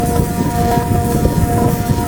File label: desperate power hit loop; ryze